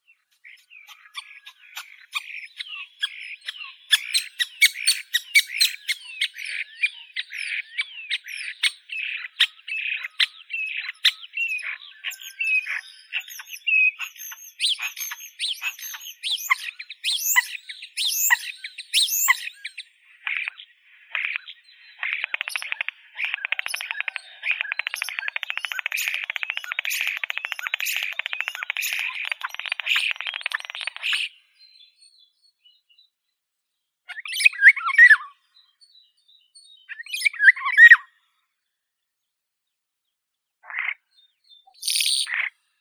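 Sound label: common starling sturnus vulgaris; skvorets obiknovennii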